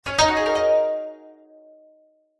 multimedia game sound japanese plucked positive tone musical